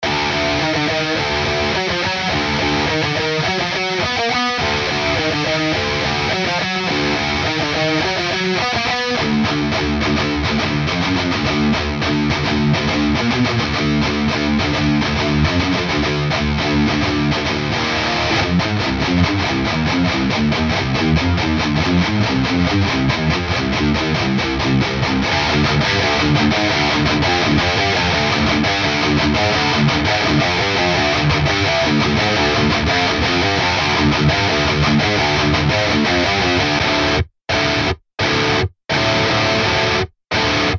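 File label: Metal Attack, drum; Vanilla Groove Studios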